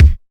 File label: clean steel kick drum d key, kick sound tuned to the D note